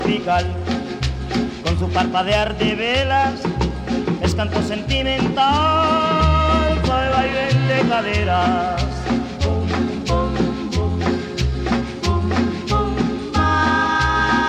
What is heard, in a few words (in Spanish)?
Ritmo De Tambo, cumbia